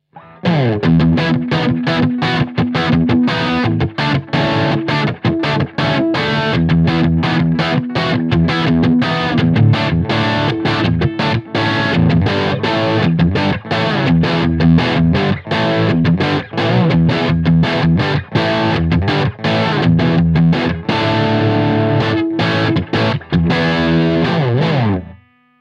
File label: crunch rock